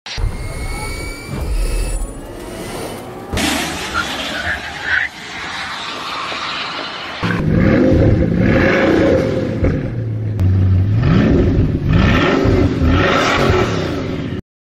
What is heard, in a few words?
Rimac Nevera; ماشین